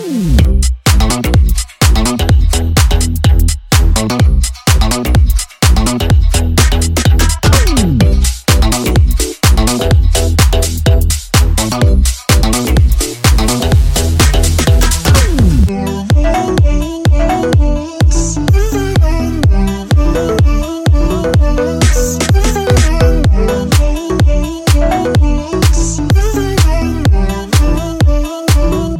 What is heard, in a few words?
Instrumental